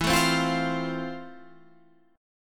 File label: F Major, guitar chord